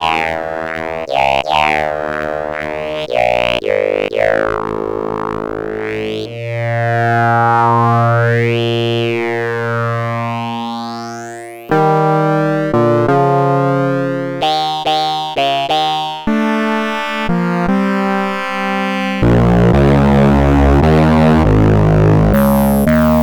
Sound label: phase warper